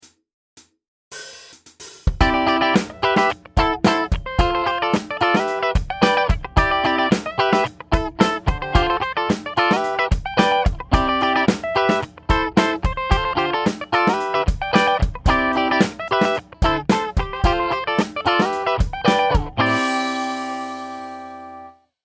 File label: Funk Rhythm Guitar Pop Funk